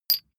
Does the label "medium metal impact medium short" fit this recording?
Yes